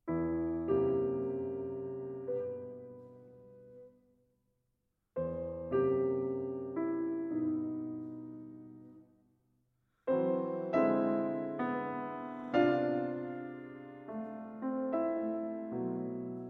Piano Suite No. 6 in E Major I. Lento Mà Non Troppo - Allegro